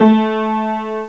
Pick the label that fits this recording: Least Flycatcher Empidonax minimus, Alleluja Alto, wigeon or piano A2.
piano A2